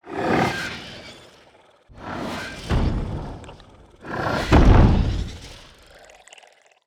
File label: Cinematic Insect Hit